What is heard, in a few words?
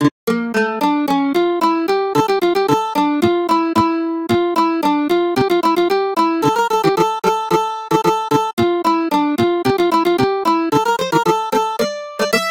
Mandolin; Rhyme of Duotime; The Bard's Tale Remastered Trilogy